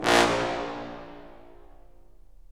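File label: brass cimb, Roland; Roland LA Composer vol. 4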